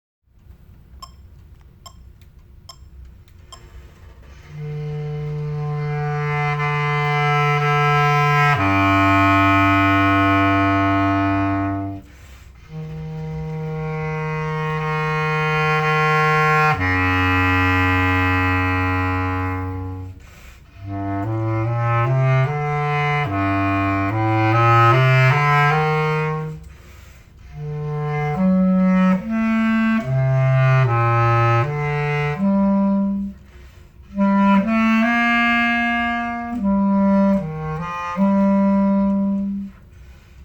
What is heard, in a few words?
bass clarinet set